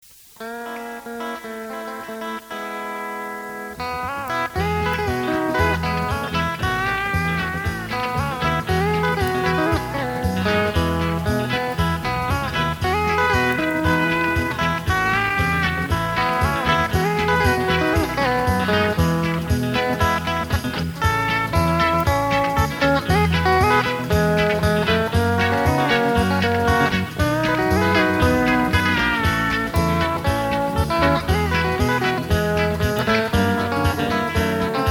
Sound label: Steel Guitar